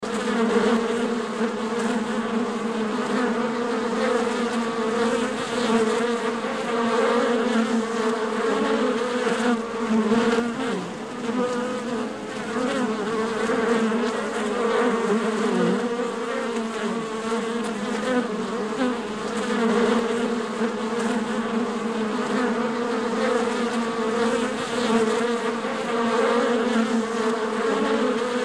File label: insects buzzing flies